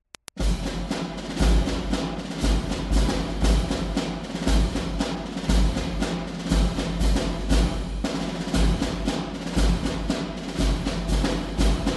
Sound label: 2-4 Drum Cadence; Formation of Troops – Drum Cadence; Ceremony Music